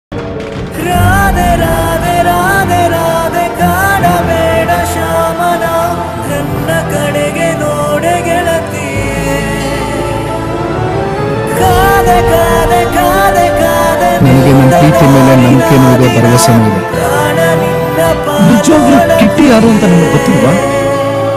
kannada ringtone song love bgm; rider movie

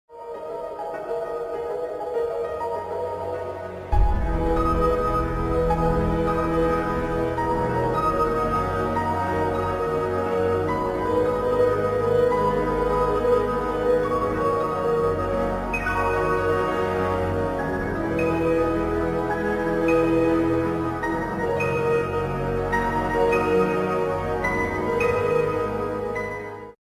black hole ringtone; interstellar

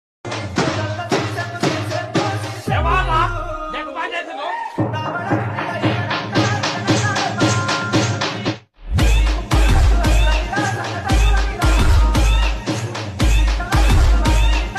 new dj song; telugu; folk

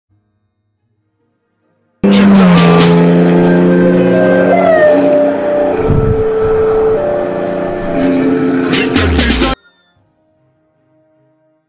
ringtone bass boosted lofi mix

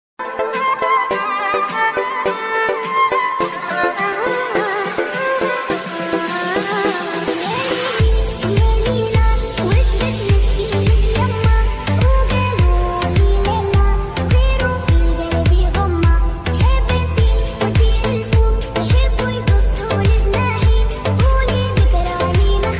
remix ringtone

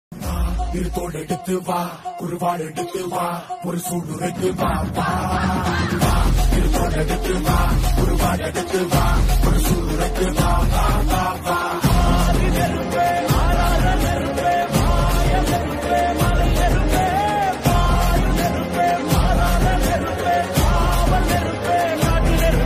Fire Bgm Ringtone; kanguva

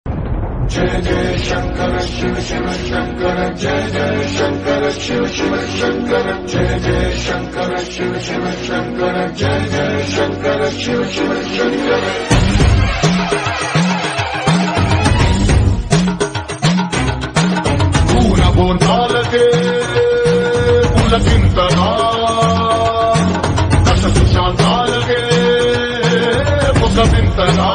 movie ringtone bgm

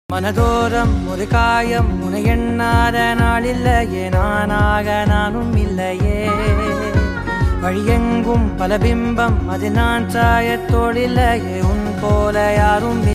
song love music

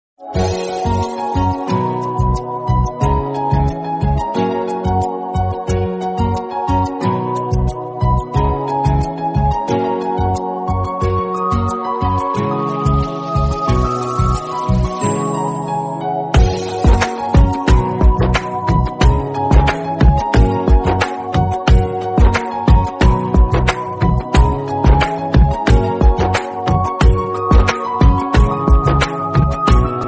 instrumental ringtone hindi ringtone

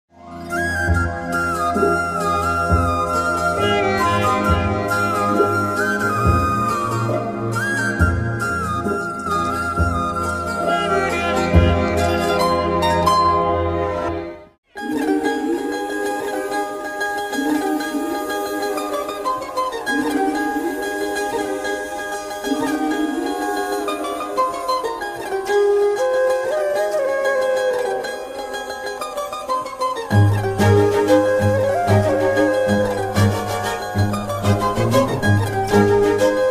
love bgm; venkatadri express movie

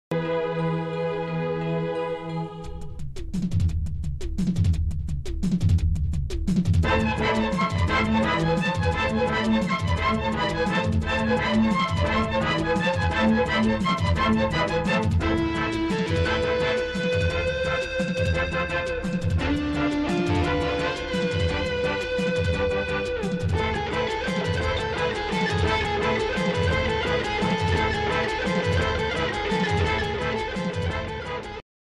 kannada mass bgm; aakasmika; rajkumar

